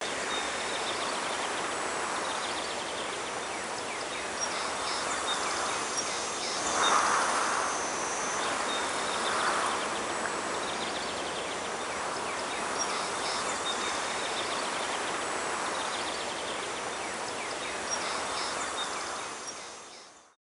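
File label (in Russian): лесные птицы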